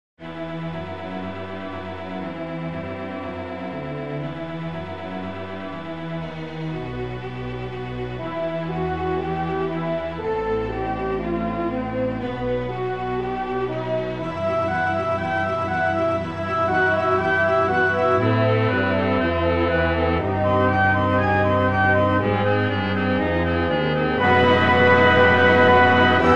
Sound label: rondo finale orchestral